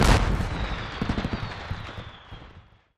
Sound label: firework explosion; Toontown Online